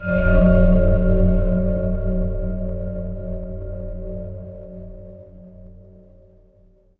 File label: horror drone